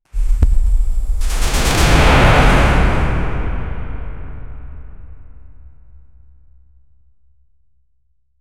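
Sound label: a massive dark dimensional, portal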